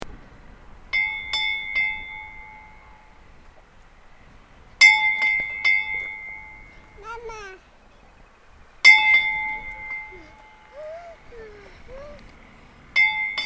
gufudalskirkja minni, kirkjuklukkur

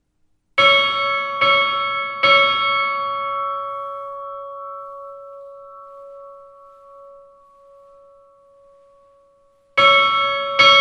midgardakirkja staerri, kirkjuklukkur

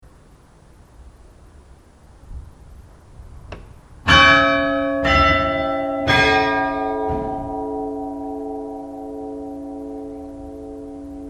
grundarfjardarkirkja allar, kirkjuklukkur; vesturlandsprofastsdaemi

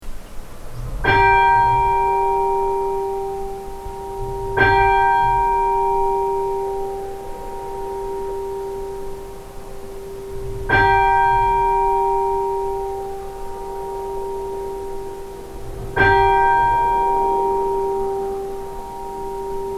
breidabolsstadarkirkja likhringing, kirkjuklukkur

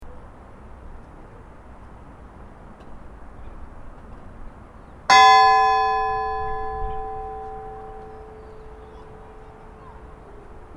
laugarneskirkja 3x3, kirkjuklukkur; reykjavikurprofastsdaemi-vestra

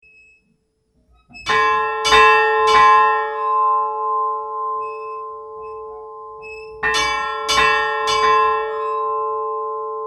helgafellskirkja badar inni, kirkjuklukkur

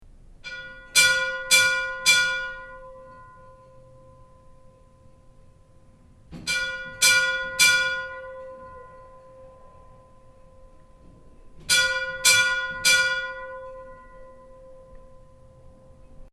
stora asskirkja minni 3x3, kirkjuklukkur

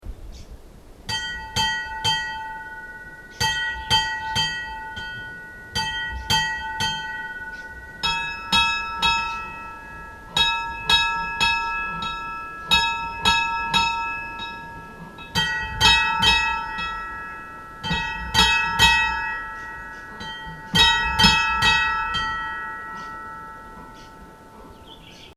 stafholtskirkja fyrir messu, kirkjuklukkur

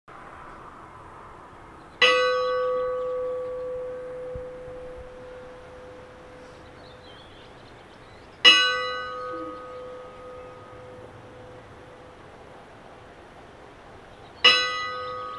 kaupangskirkja likhringing, kirkjuklukkur

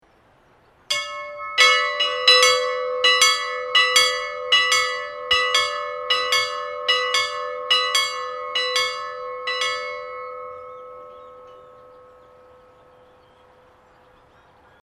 kaupangskirkja samhringing, kirkjuklukkur

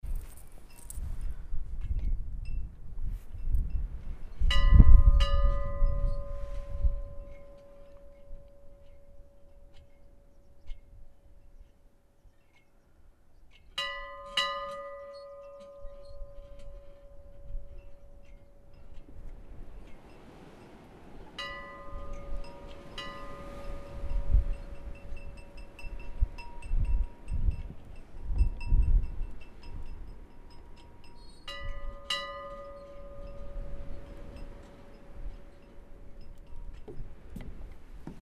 snoksdalskirkja likhringing, Kirkjuklukkur; Snóksdalskirkja var vígð árið 1875 og er ein elsta bygging í Dölunum; vesturlandsprofastsdaemi

